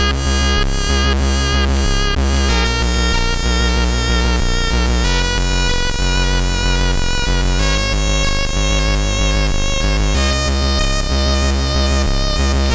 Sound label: Game Over Tune, sid MOS6581R4